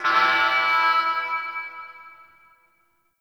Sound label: Puzzle Switch Alarm; Warframe; Missions; Raid; Grn Puzzle Switch